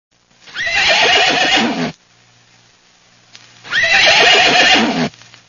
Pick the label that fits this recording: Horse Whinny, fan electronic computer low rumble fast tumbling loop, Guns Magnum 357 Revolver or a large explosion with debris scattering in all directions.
Horse Whinny